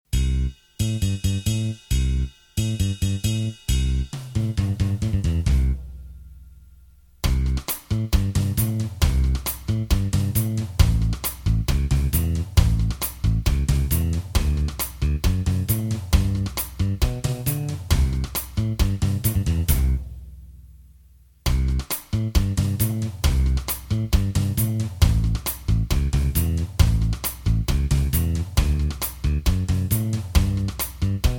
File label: (C) No Lead Guitar